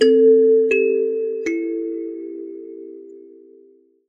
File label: kalimba